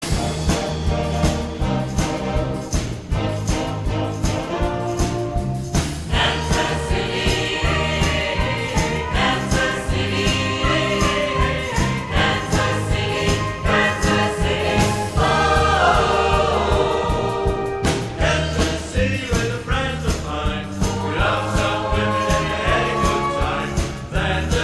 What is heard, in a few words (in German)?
Jugendorchester Chor Medley; Jugendorchester Neuwürschnitz